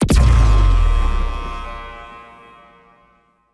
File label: goal sound